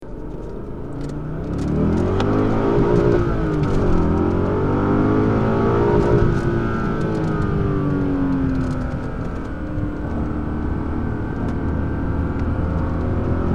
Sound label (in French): Z8 a bord, bmw